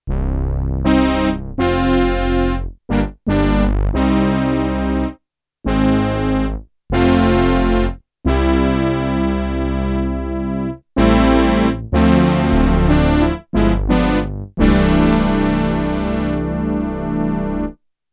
Korg DW-8000 MELLOW HORNS, Factory Patches

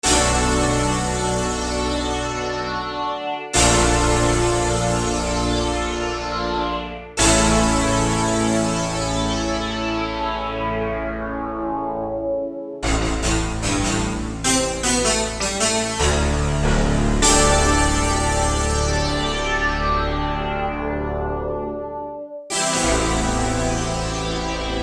Fast fuzz Res; Digital Synthesizer

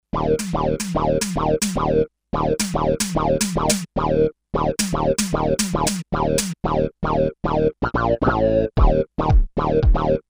505 Hard, Kawai K5000S

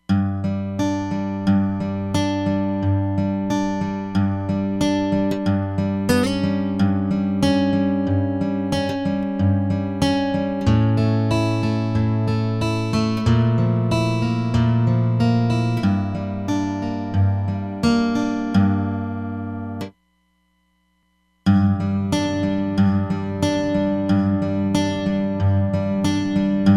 Guitar, Roland DEP-5; Factory Patches

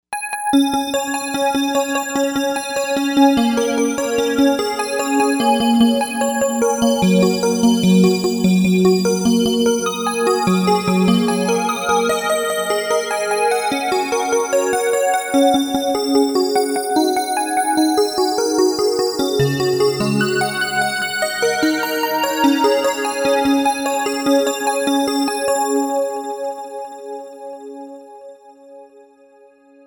SparkleArp, Novation UltraNova; Factory Patches

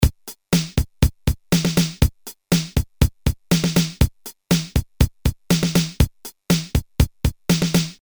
Fill-in 8 Beat, Boss DR-220A Preset Patterns

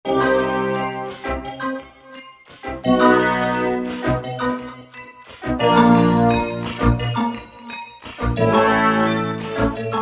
Roland V-Synth; Factory Patches

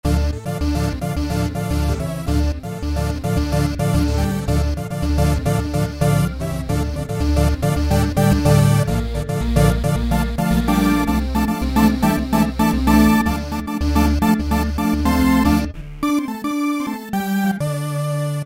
LO SAWVOX

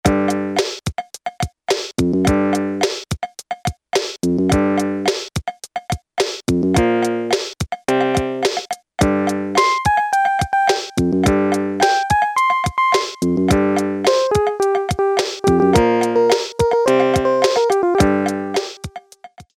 HIP-HOP 1, Casio Rapman rap patterns

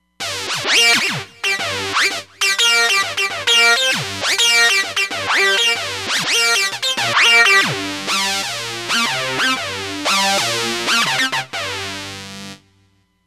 Distro FXM, Roland Fantom XR

